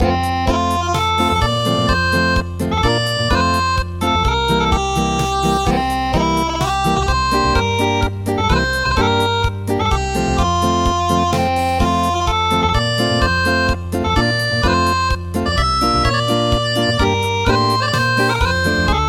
Acoustic, traditional; The montanara

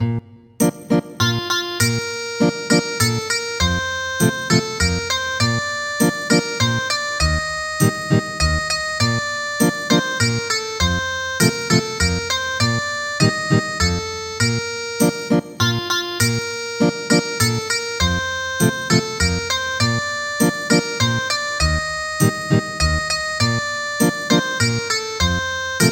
Ethnic Guitar

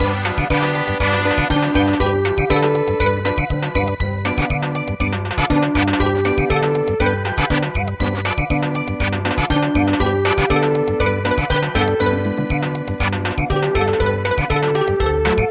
Samba

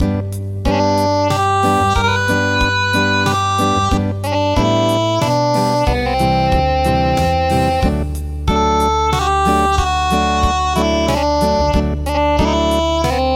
Acoustic

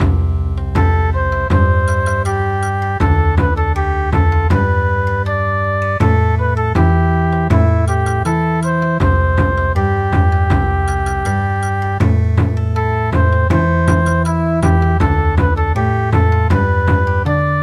Bon Odori; Cha puk

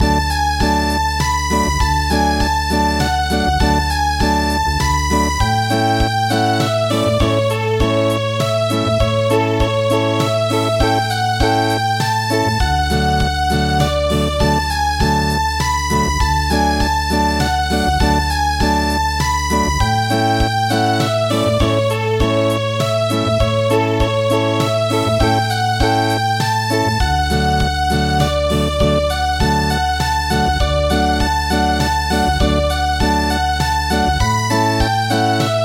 Oberek Acoustic

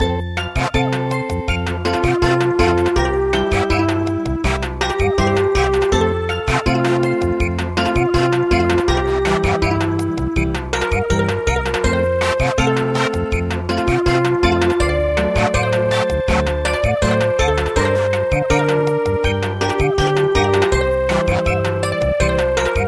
Samba

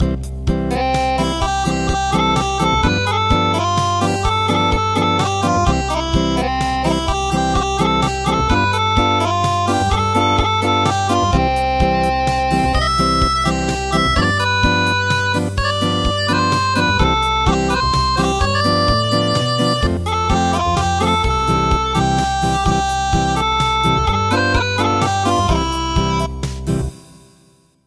Acoustic

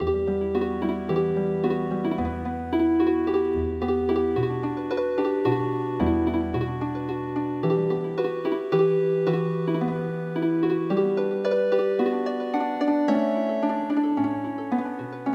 Healing Harp, traditional

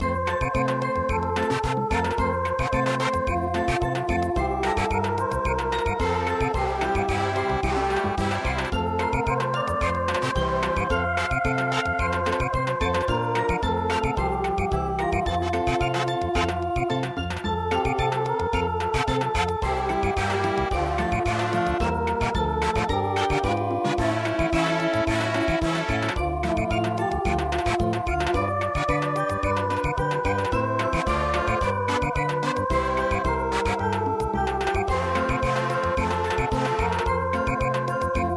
Samba

